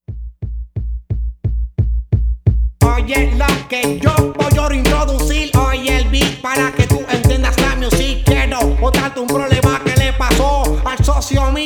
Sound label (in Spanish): Oye El Beat